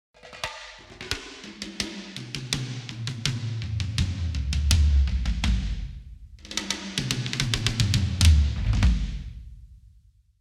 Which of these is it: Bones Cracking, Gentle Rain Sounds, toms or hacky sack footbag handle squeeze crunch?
toms